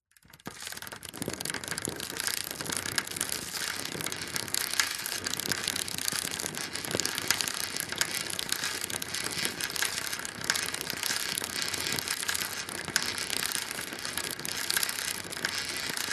bicycle pushbike pedaling slow speed close to back wheel broken clicks; vehicles; zapsplat